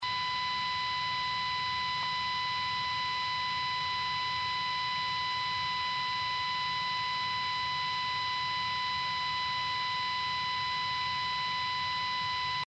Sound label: noise